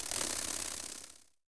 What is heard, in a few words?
Bee; Seer; GamigoNA